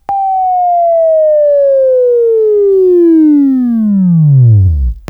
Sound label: Buzz, Left; Sweeping Training